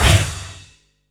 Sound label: 87 VRAC CRH, CYMBALS, Industrial Loops; USB Soundscan vol.24; AKAI